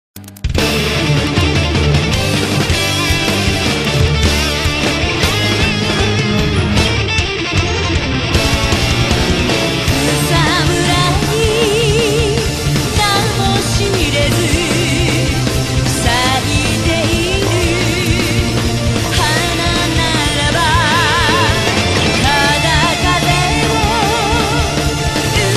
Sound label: punk metal; Lady Oscar; Jacques DEMY; bande originale du film